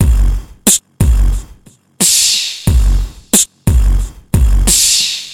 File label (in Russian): zvuk bitboksa